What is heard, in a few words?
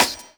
Dance Drums; Best Service; ProSamples vol.15; AKAI